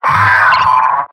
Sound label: pain sharp; Pyro